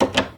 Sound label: Door Handle Jiggle